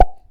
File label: Plop